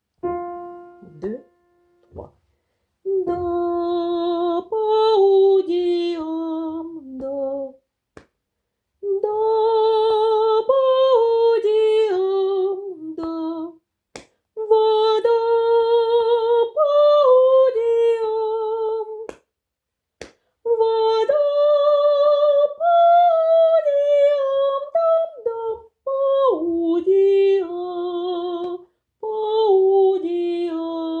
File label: Sarabande Soprano var